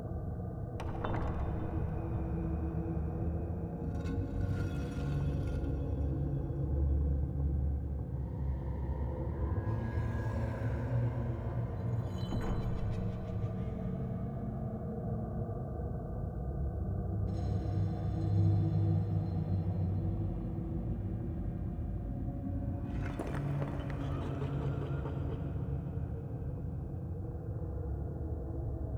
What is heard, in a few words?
bonus game ambience; netent